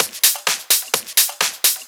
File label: Discohouse Loop 128 BPM